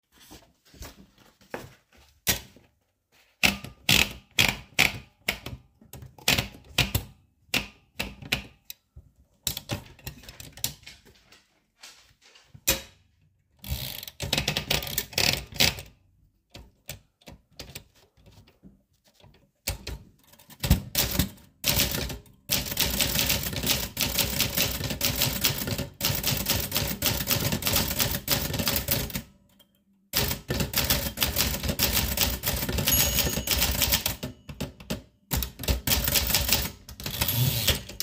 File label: Remington All New